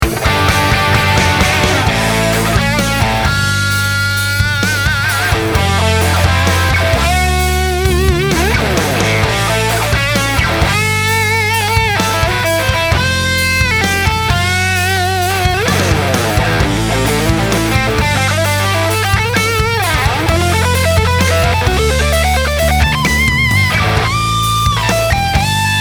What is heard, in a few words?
solo without rhythm guitar